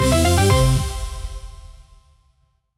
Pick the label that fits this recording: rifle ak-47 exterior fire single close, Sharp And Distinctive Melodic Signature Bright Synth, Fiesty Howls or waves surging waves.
Sharp And Distinctive Melodic Signature Bright Synth